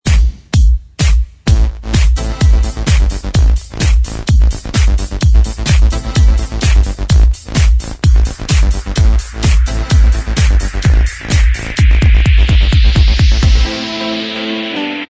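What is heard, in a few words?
Instrumental Track, backing tracks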